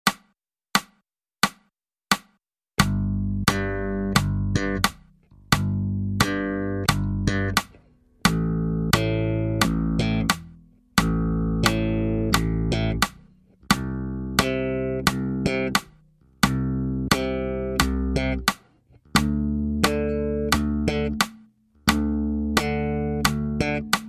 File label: Funk Bass